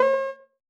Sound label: Keys; Pothole